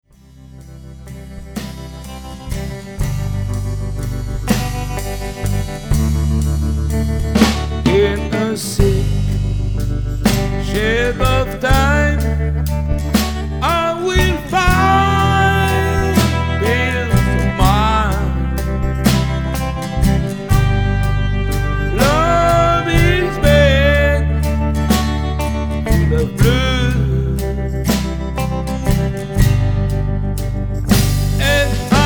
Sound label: g blues